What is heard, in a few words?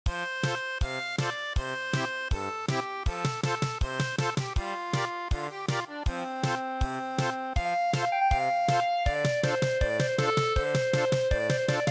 German Polka, traditional